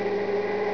jump

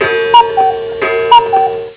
cuckoo clock

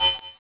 beep metal

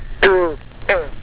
frog

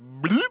bloop

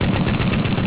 machine gun

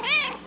cat shorty

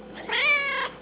cat shorty

